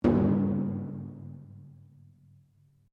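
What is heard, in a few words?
Drum Taiko forte Double Hit, Japanese Taiko Drum; Miscellaneous Musical Sound Effects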